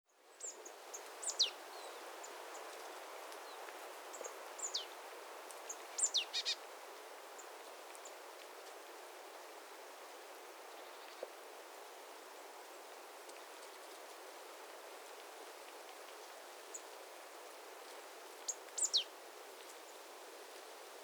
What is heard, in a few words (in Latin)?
Poecile palustris; SETE